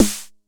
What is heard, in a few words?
Snare 909 Tune1; gate experiments